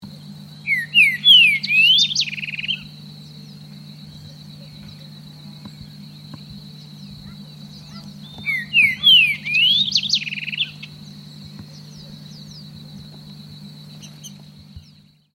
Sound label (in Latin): Saltator similis; S Ant do Pinhal; SP; Silveira